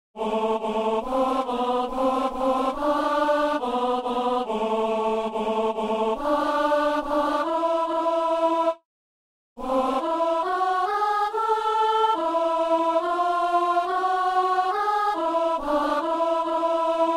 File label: alto; Maintenant, Seigneur